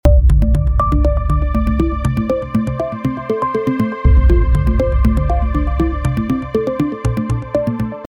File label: buzz 120 bpm audio logo; art of samples